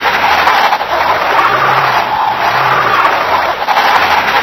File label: road scrub, Tires; Kart; AOS RF1 Kart Demo